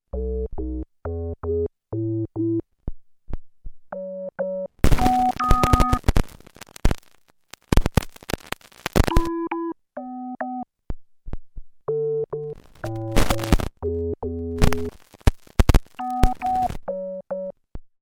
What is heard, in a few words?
GR-700 Failure